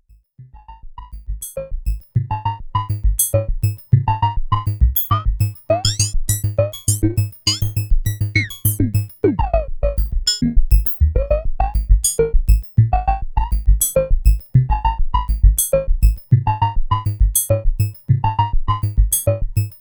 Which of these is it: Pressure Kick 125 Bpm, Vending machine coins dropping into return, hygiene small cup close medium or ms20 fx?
ms20 fx